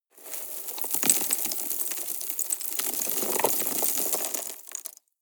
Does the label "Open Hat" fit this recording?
No